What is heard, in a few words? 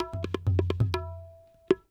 PERC LOOP ETHNO 125